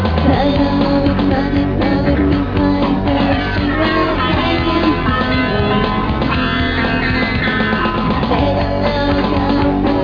scream

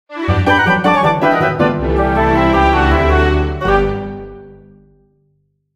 bright, jingle

